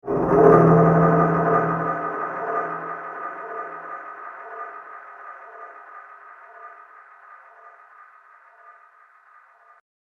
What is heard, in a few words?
Tomb Of The Night Lord, scary soundscape; fantasy; role playing games, magic videogames; Andrew Fleming